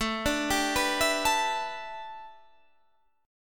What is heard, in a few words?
A 7th Suspended 2nd Suspended 4th Guitar Open D